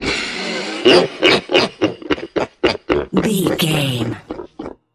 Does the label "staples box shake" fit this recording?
No